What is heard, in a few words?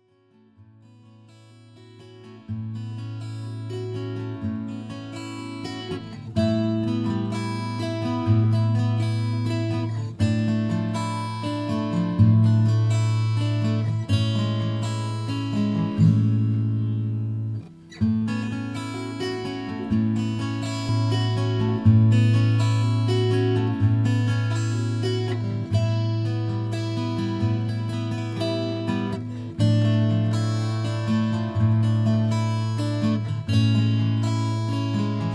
Acoustic Guitar Double Track take 1 Samson mic in left ear take 2 Samson mic in right ear